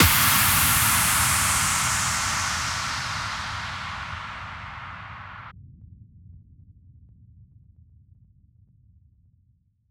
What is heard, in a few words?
impacts; drum and bass